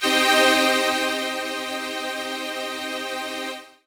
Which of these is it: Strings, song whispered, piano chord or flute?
Strings